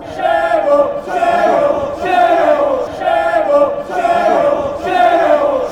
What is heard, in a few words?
chants